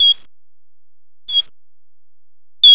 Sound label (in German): alpenfleder, Flattertiere; Saeugetiere